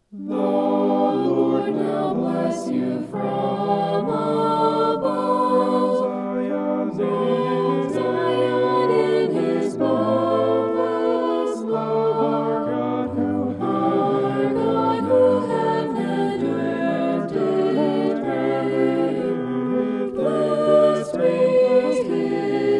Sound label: vocal poly; psalm 134